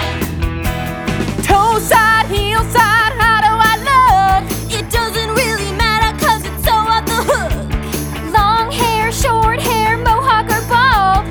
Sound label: vocals